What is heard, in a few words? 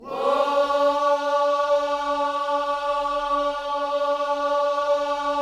WHOA D 4, Vocal Gospel; ILIO; Vocal Planet